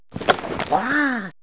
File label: Ui Upgrade Bat; Rabbids Big Bang; Android iOS Windows gamerip 2013